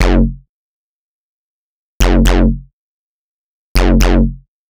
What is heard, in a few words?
bass